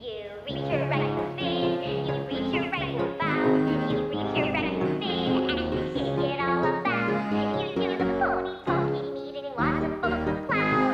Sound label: Pony Pokey (Instrumental); My Little Pony OST Repository; Season 1; Bonus Music